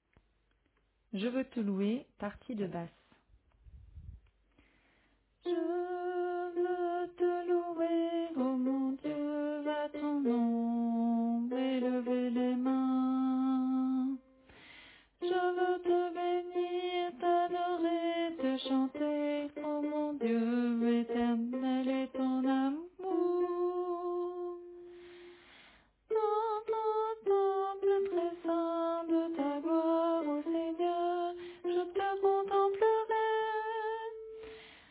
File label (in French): Basse